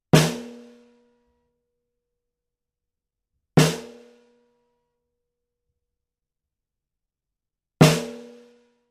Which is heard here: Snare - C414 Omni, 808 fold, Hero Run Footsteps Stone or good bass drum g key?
Snare - C414 Omni